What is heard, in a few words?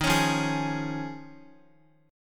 Eb 7th Suspended 2nd Suspended 4th Eb, Guitar; strummed; chord